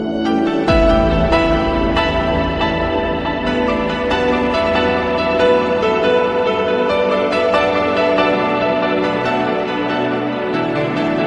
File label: canarias tone; ringtone